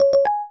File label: ambiguous; UI Sounds; jbl